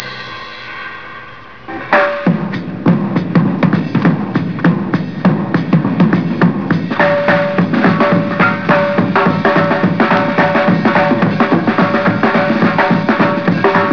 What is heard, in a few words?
drums